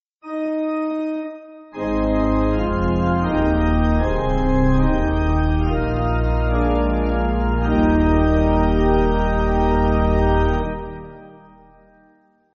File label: Amen, Pipe; Organ; L. Bourgeois